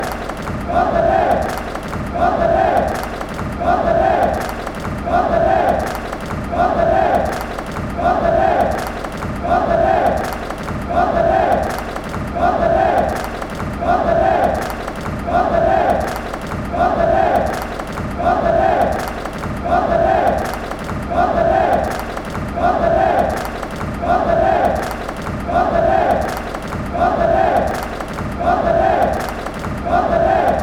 aplaudir, fan chants; monterrey

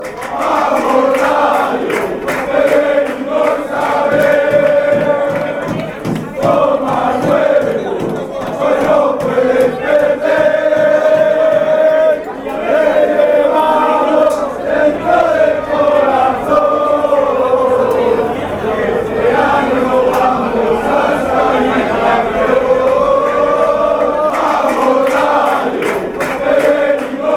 heart fanchants

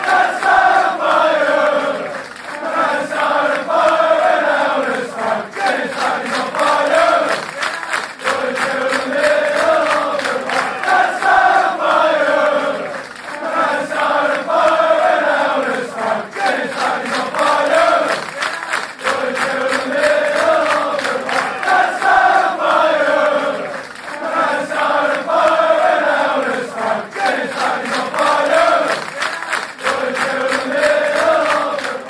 cant start fire without a spark

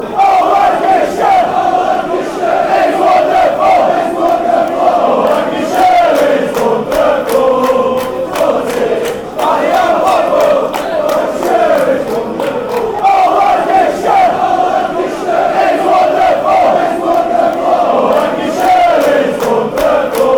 lancashire wonderful blackpool

